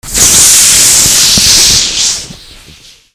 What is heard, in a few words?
TOYS